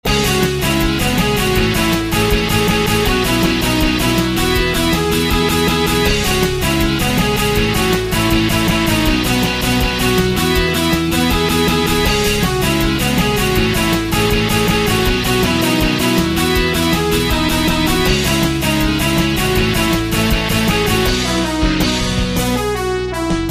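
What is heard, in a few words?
Polyphonic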